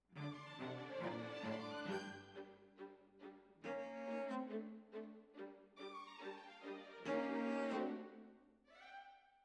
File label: Cellos Over